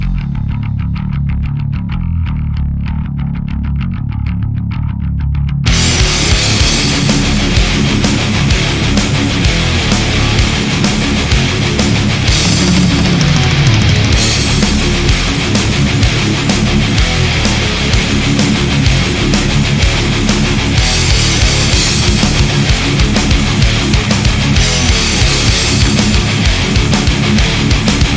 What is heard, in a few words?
heavy metal